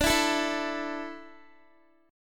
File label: D Suspended 2nd D down Guitar, chord; Dsus2; strummed